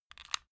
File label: stick lighter button extinguish